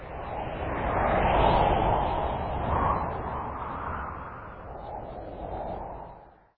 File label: gust